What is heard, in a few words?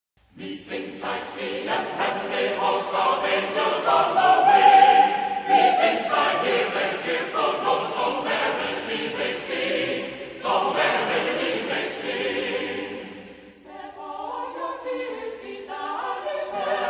Chorale